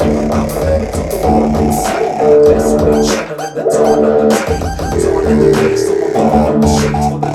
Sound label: loop